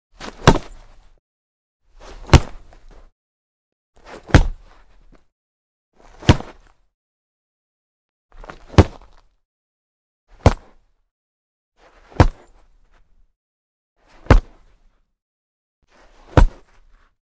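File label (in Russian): американский футбол удар по мячу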